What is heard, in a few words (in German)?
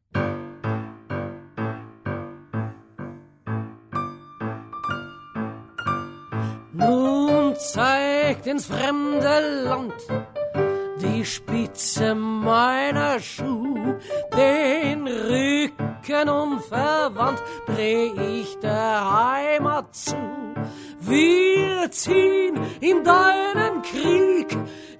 marsch